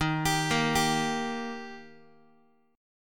Eb Major Eb down Guitar 4A,4E,5A,5D,5G sharp,6C sharp; arpeggiated; JGuitar